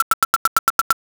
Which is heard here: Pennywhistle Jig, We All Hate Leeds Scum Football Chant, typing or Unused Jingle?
typing